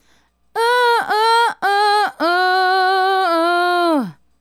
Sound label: Female Solo, Hip-Hop and RnB Vocals